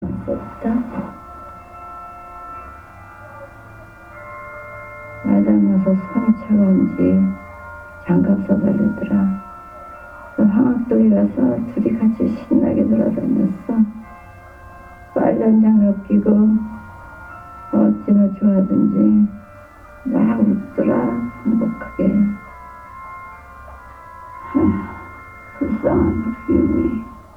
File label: Hymn